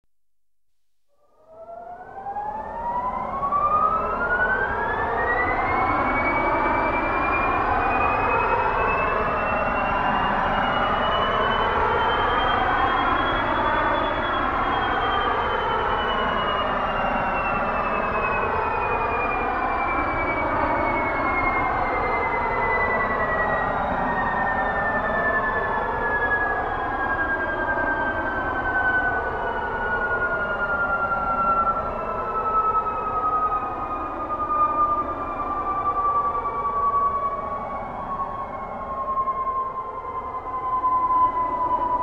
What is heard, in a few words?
Simulated Jet Engine (Run Up, Electronically Generated Sounds; BBC Sound Effects Library